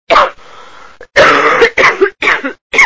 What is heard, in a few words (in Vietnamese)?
Am thanh om va ho